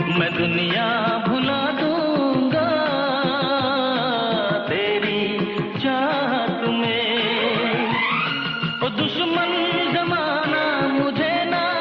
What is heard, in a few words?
Old Hindi Song Ringtone